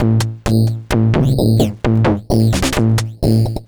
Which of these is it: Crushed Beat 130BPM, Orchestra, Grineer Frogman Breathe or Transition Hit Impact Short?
Crushed Beat 130BPM